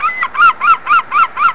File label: GULLS; ANIMALS